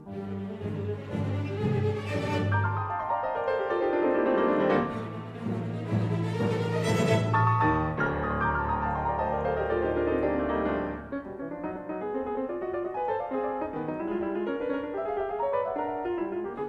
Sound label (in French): Concerto pour piano et orchestre Allegro vivace